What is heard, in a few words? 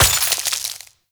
Vial Ability Ice Shatter; Warframe; Warframes; Alchemist; Elements